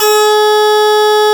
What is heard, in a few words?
Harpsichord C3